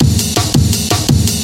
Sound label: amen warfare; Amen Breaks